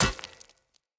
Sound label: Short Sword Medium Impact Rock; Weapon Impacts; Player; Swords; Environment